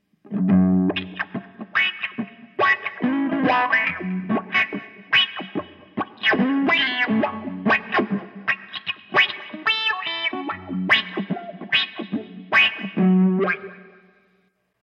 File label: rasta wah